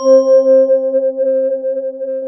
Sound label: ROLAND MKS-80; FANTASIA; Rubber Chicken Software Sample Bank